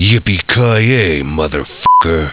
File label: YIPPIE; Duke Nukem; 3D Atomic